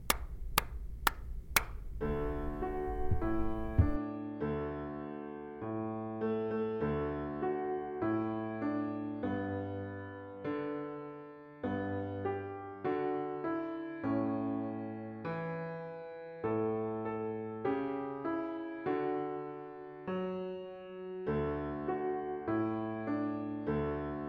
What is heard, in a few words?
jingle bells with 4 beat count in 100